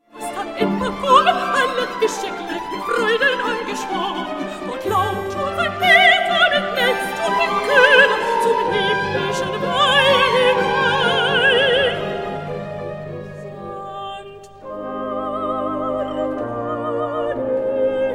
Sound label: symphony no 4 in g major for soprano and orchestra 4th movement